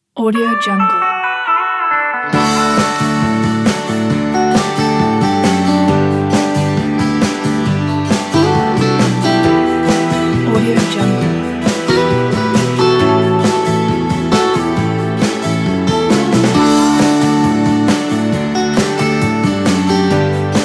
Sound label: uplifting country